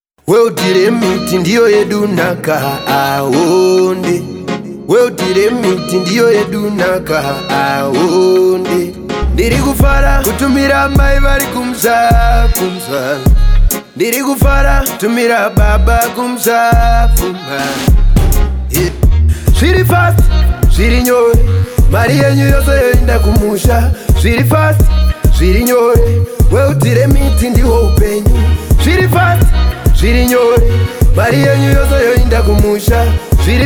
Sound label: jingle; world remit jingle